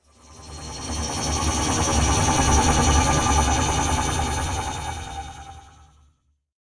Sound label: hover; PC Gamer IT CD 47; Centauri; alien x fire demo